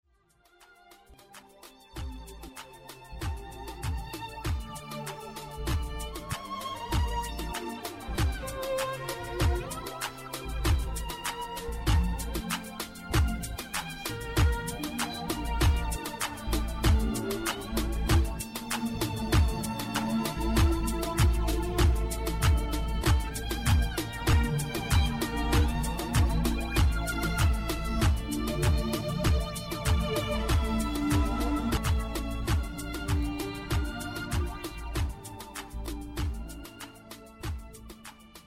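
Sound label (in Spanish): violin eléctrico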